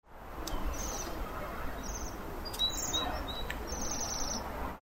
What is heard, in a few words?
waxwing, kilmarnock